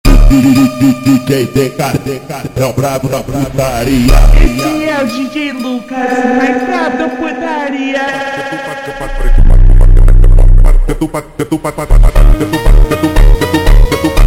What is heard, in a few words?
V2 Slowed